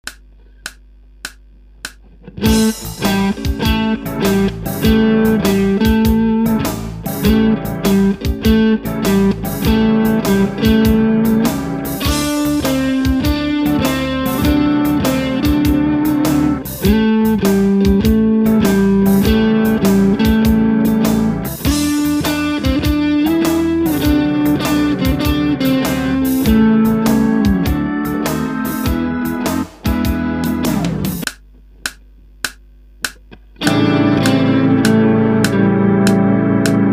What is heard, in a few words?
Blues Etude